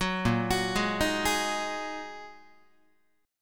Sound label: A sharp 7th Suspended 4th Sharp 5th A sharp, Guitar; arpeggiated; JGuitar